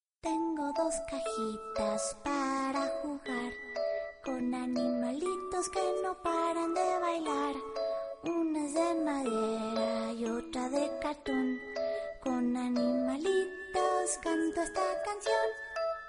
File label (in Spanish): sirene